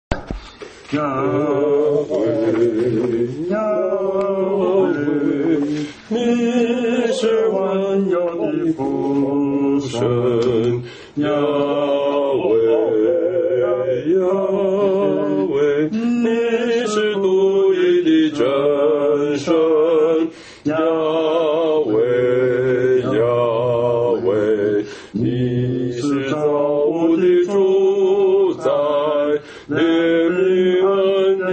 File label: hymn; worship